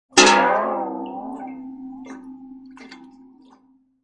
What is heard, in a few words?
Weird warped pot liquid sound.; Sound Design; SFXsource